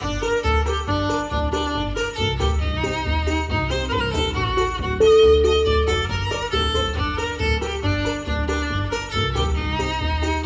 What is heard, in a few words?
jingle bells sadness